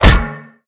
steampipe hit flesh; weapons; deluge

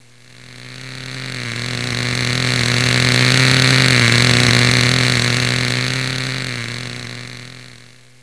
petrol car

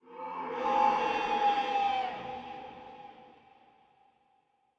Dino Distant; docks

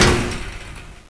gate locked; cs greatassault

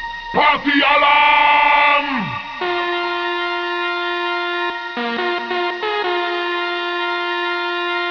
party alarm

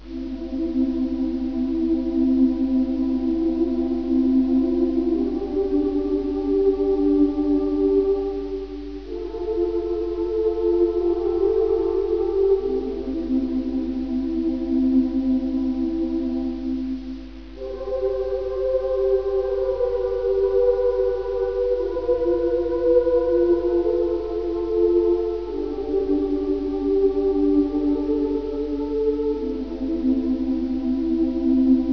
choir; de grande

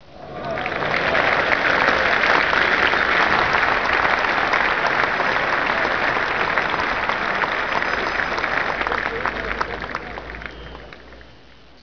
clapping

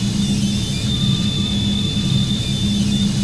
pipe; de fog

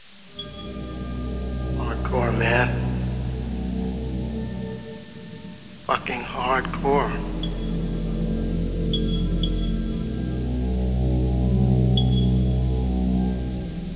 hardcore

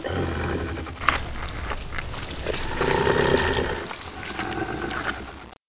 gonome eat; mystic radar; mr zombie1

